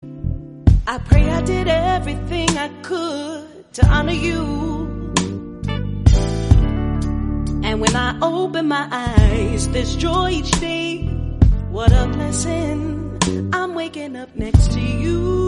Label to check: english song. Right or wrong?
Right